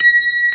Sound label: B3 C 6, ORGAN; VOCAL ORGAN; ORGAN #1